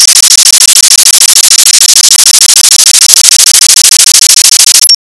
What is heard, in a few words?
digital rain